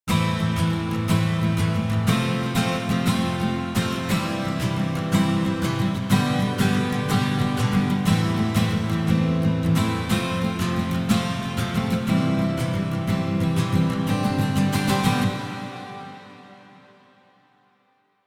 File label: Reverb - Guitar Strumming - Painted Gym; CRTIV Reverb